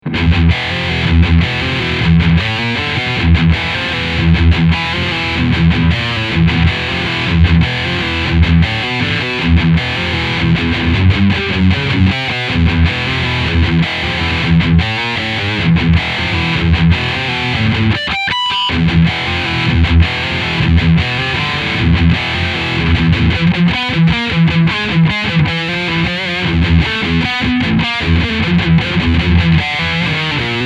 Full Gain

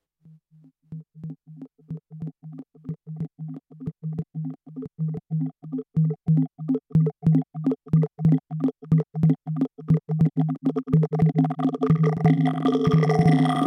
Particle Drum

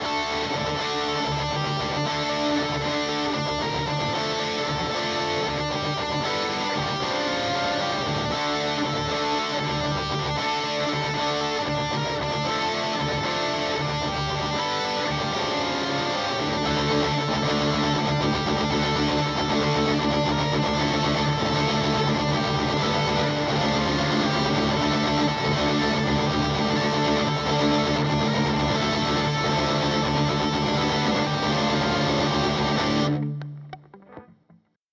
Boss GT-1000 Core Hi Gain

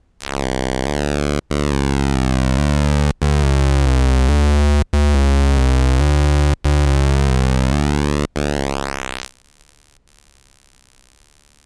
Spread Pulswidth; Demon Core

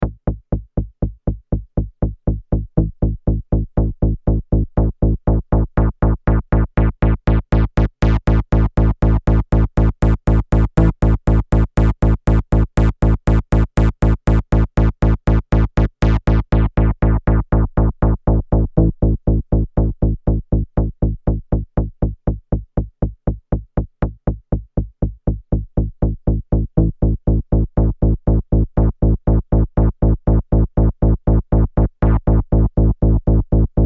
Shape Tone; AKAI SG01v

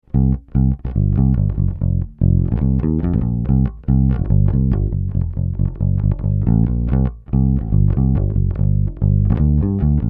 Block 800b Pbass neutral

